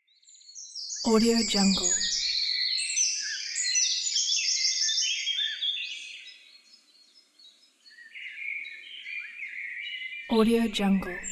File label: birds morning; vinyl sounds